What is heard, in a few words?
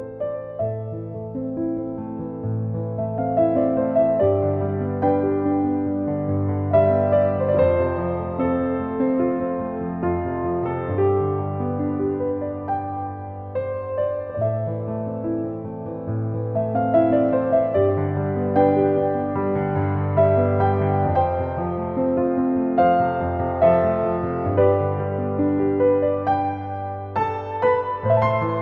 piano ringtone